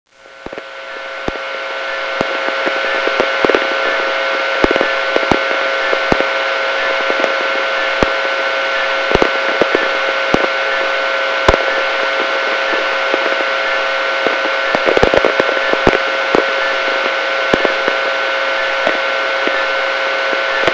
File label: tx high power 2 miles